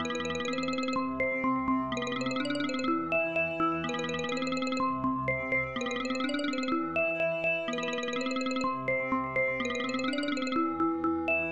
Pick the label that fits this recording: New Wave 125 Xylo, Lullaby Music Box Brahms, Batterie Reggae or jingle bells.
New Wave 125 Xylo